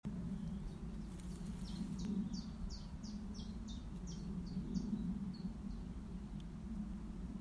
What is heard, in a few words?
Chiffchaff call